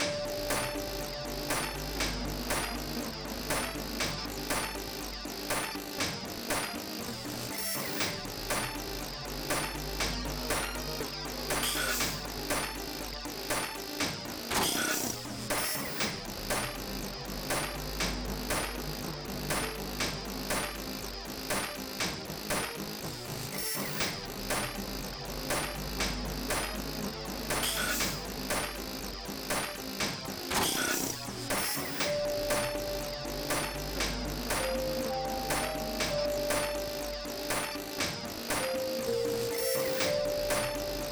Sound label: Music Gear; Dreamball